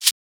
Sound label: Shaker; FLPRO